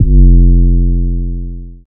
Trap bass, EDM

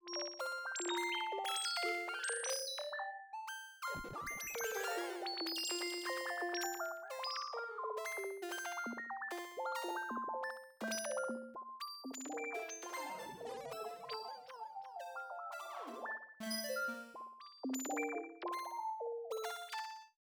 fx melodic wakeup F maj; base pack

